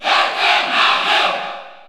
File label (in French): Cheer French; Dr. Mario; SSBU